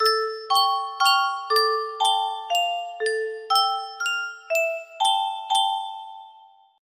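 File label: sad, music box melody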